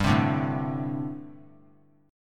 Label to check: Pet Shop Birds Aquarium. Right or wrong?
Wrong